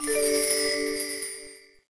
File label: star Pickup; Feeding Frenzy